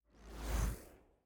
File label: digital whoosh